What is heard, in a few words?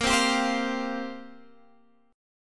F Suspended 2nd Suspended 4th Bb